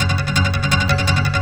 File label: Chug; Sonic Foundry (Sony Creative Software)